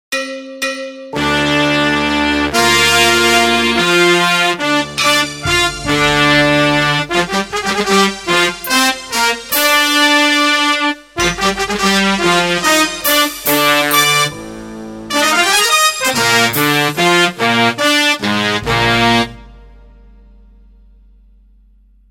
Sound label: Fanfare